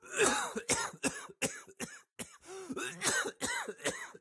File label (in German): shisha husten; minecraft